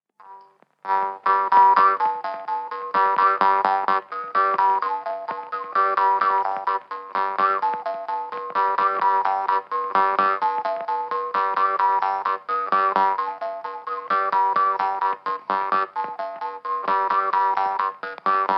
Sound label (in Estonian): labajalg, parmupill, folk; Kullamaa; Ülejala labajalg